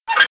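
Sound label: Generic Squeaks Low; Playable Tutorial Level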